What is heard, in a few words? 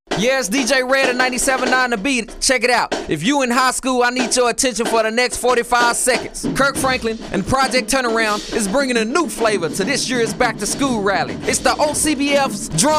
drumline competition